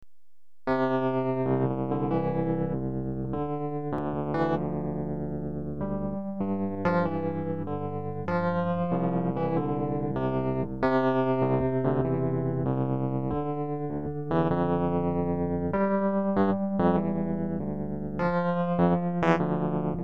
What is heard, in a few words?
easy melody